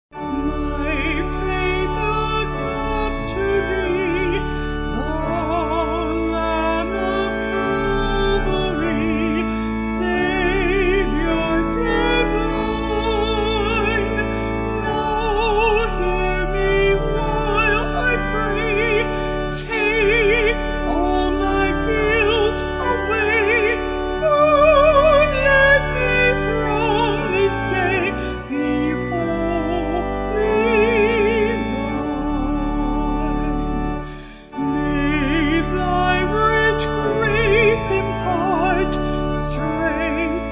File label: Vocals Organ